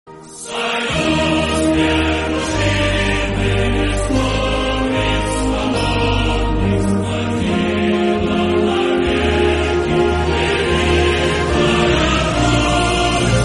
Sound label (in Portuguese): hino russo